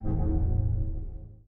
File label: mystic background sound